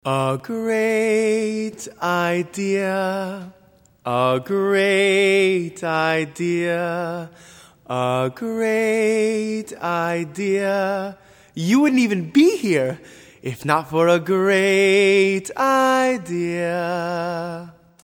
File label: Lullaby